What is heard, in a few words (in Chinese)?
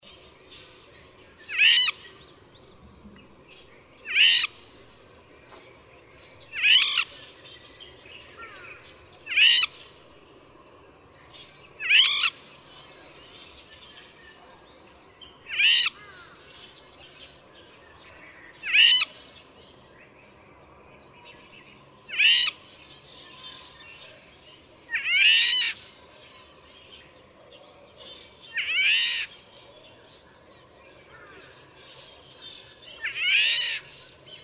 黃鸝; 黃鶯、黑枕黃鸝; 黃鸝科/留鳥、過境鳥